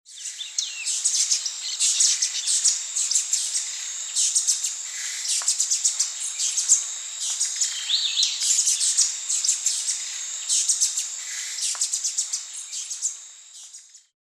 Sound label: Hemithraupis flavicollis, song; S. America; Eastern Lowlands